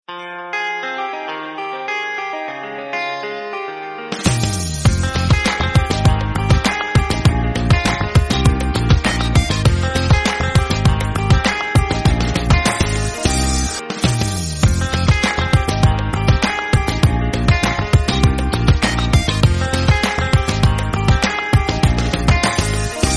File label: nice relaxing call notification tone; iphone ringtones